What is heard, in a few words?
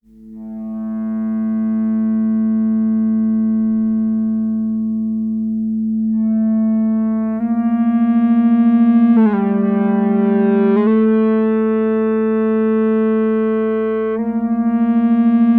ebow